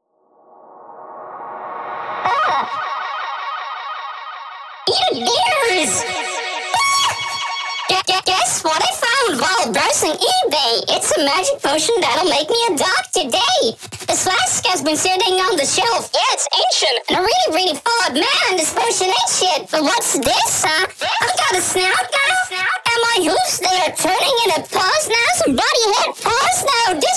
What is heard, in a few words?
vocals